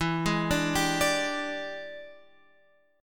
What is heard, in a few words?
E 7th Sharp 9th Guitar Open F, chord; E7#9; arpeggiated